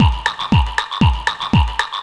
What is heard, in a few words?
FX LOOPS